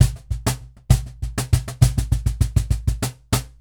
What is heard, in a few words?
Cajon Loop 100BPM; musicradar